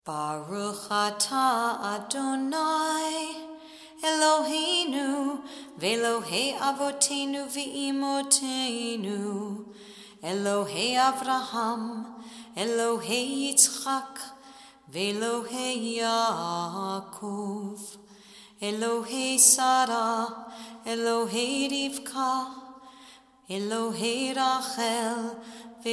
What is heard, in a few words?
avot vimahot; ramah